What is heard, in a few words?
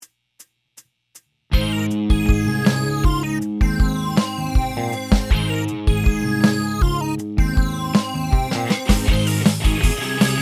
midi version; Au Pays Du Quacking